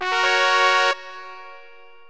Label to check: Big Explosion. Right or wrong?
Wrong